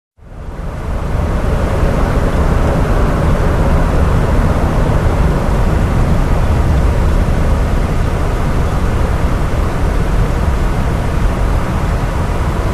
Cathedral Room Tone, air tones

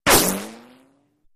Bullet Ricco Single

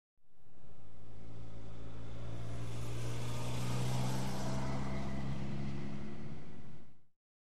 Car Exterior Slow By

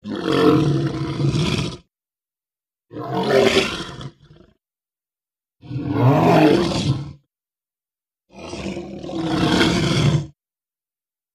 Monster Type 2 Roars, monsters

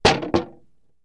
Mallet Hits Wood Shield

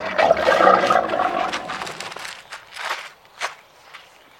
Camel Startled Roar

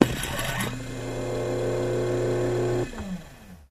Hydraulic Pump Dent, seats & stools

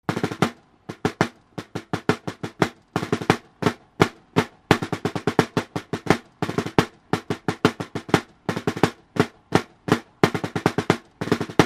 Single Snare Drum, drums civil war

